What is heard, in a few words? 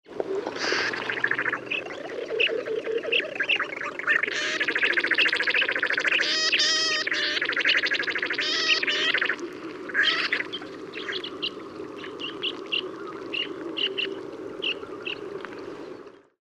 Pectoral Sandpiper, Calidris melanotos; shorebirds rails